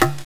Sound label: African Perc, Genesis; The Lion King; M8